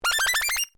UI Commit, User interface feedback sounds; Computers (Sound Effects:Electronics)

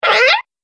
Nasty Little Monster

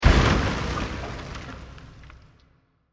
Massive Impact With Various Particles And Reverb; Blasts; Crafted Signals

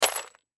Bullet Shell; Bullet Shell 3030 Drop Debris; Warfare; Blastwave

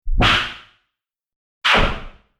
Automatic Pressurized Door Open-Close; Airy Release; Automatic Door Trap Door Pressurized Door Airy Release Open - Close Scifi Science Fiction; Doors; BBFX

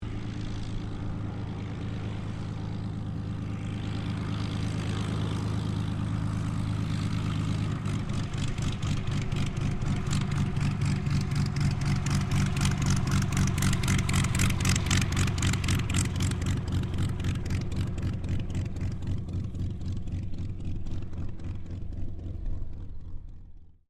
AIRCRAFT P-40 KITTYHAWK TAXI; P-40 Kittyhawk / Warhawk / Tomahawk; Airplanes; Vehicles

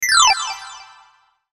CHIME ORGAN, Chime Organ Descend High; Chime Organ Descend High Production Element Imaging Element Accent Transition; Multimedia; Blastwave

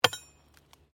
Digging Pick Concrete

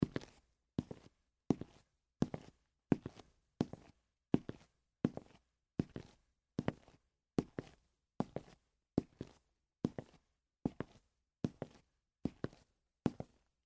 Dress Shoes Walk, Concrete; Footsteps; Footsteps (Sound Effects:Human)

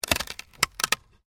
WOOD PLANK SPLIT

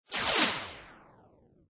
Laser Hit Reverb; Scifi Production Elements, Set 1: Laser Hit Slow

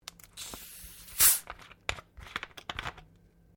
Bottle Softdrink Open; Various bottles; Pops; Objects

